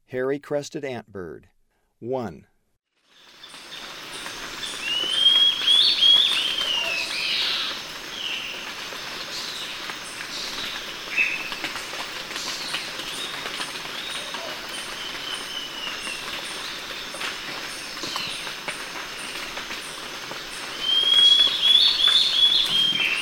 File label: Hairy-crested Antbird; S. America; Eastern Lowlands